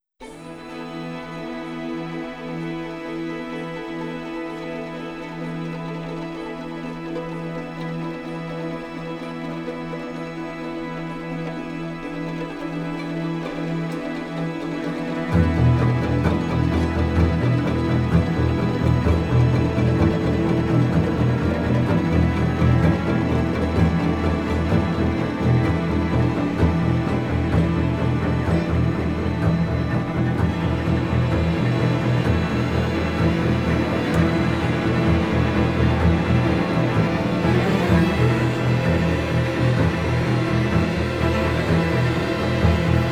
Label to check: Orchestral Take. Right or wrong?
Right